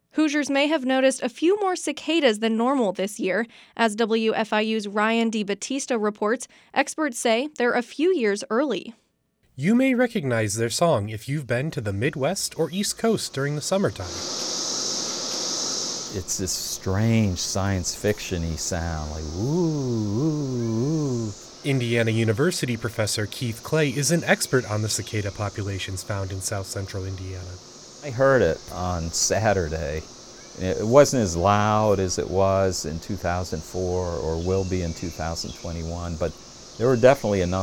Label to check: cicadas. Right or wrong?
Right